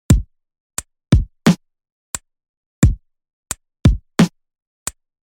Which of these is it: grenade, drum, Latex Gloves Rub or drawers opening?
drum